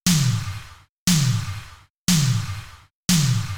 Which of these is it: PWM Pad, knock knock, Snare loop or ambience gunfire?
Snare loop